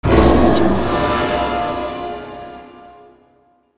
strange horror impact

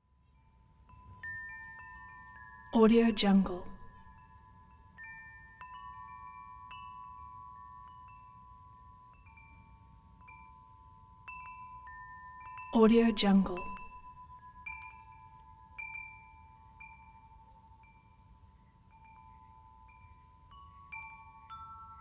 wind chimes; prosoundfx

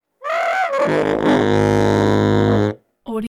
bass saxophone

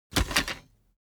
impact scrap drop metal and wood soft small

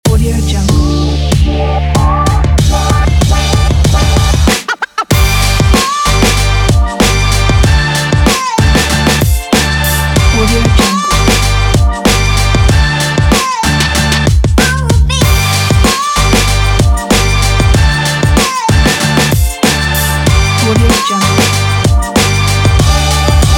hiphop

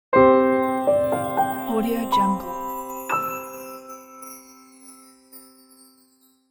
simple logo

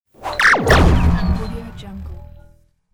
animation sound; volkovsound

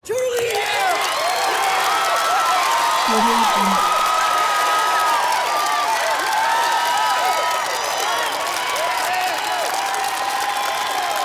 crowd cheers applause

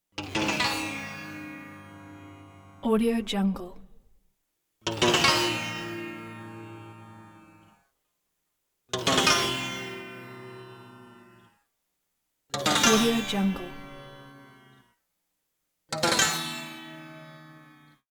lute out of tune guitar metal string twangs; prosoundfx

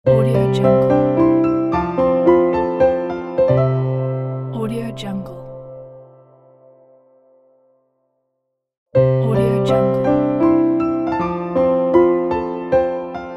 warm piano logo

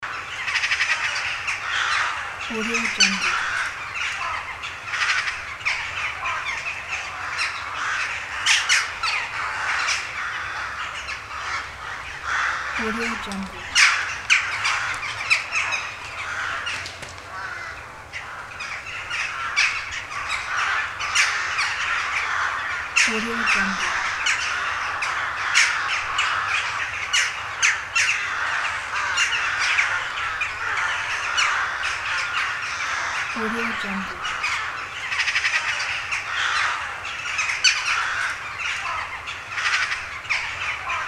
crows in city

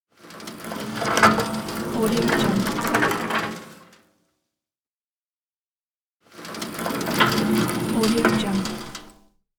metal trolley

